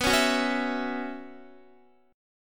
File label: B Suspended 2nd Suspended 4th, Guitar; Bsus2sus4; strummed